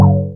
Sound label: PERC FX; SFX